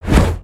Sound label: Mole Whacker Swing